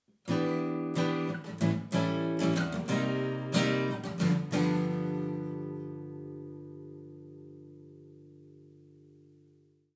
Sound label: ACC 60cm SM57